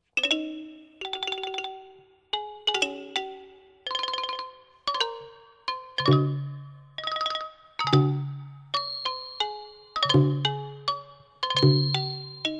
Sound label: Ranat